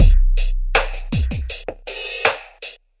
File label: lofi hatty crackle, drum loops and breaks, 080 bpm; 000 to 080 bpm